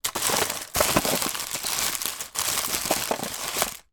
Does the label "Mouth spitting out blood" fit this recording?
No